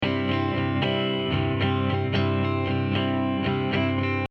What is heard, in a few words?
overdrive